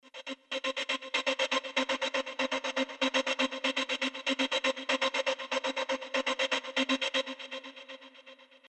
Envelope Stutter Crystallize; Elysion 2

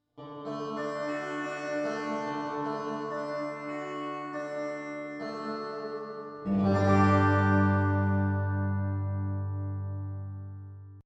Rev-X Plate; Steinberg UR816C

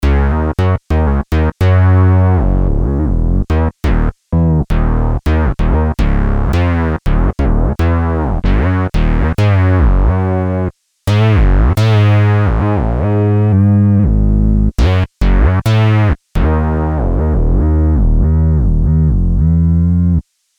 JD-800 Model Expansion

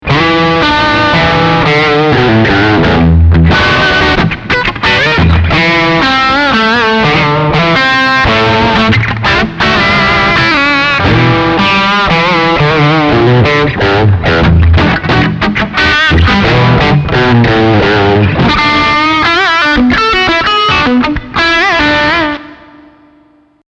PLEXI

